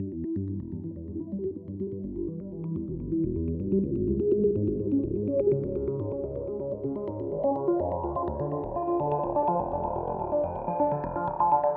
Trance Synthwave

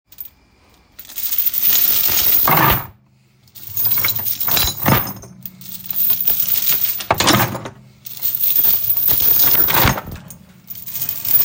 Chains Dragging And Dropping

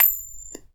Coin Flip And Catch

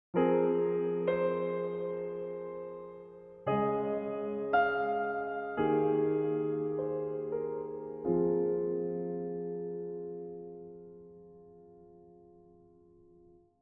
Piano mood melancholy

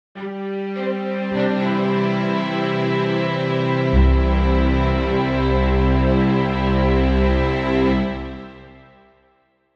Strings Intro

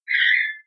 Zigzig

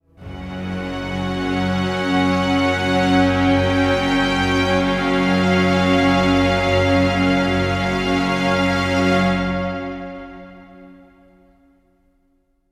Suspence; Diablo Wrath

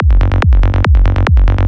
Psytrance Psy Trance Kick Bass Loops 142bpm F; Psytrance1 Syndrm

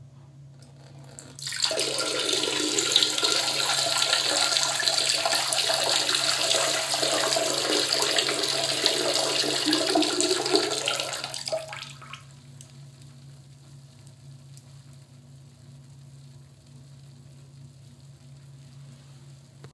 Peeing

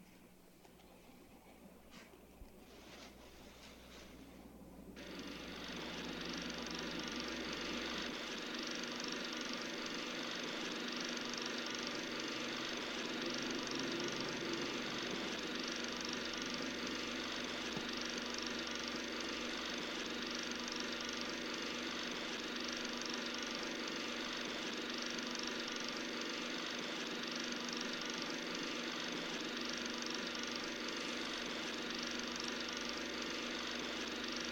Film Projector

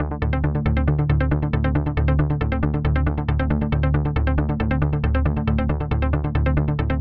Synth Loop Trance Arp 137 Bpm

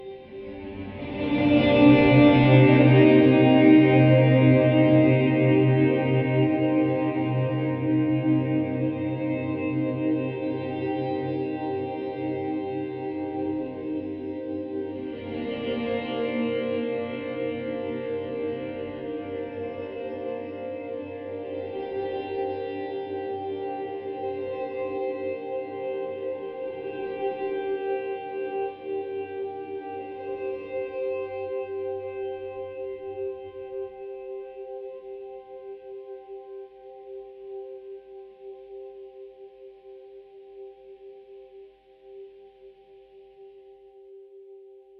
Cinematic Sweepy Guitars Dark Drone

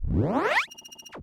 Power Up Regeneration

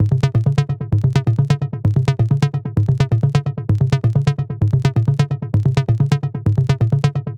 130 Bpm Acid Echo Bassline

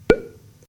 Doppp

